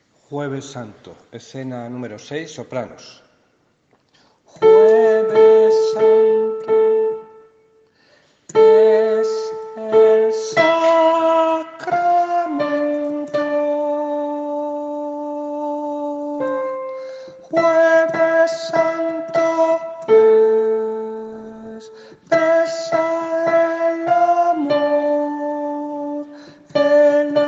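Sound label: soprano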